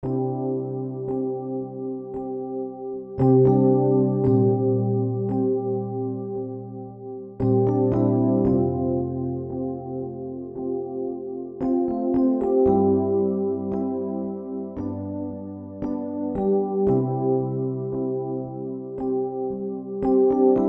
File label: Classic Basic Electric Piano; KeyZone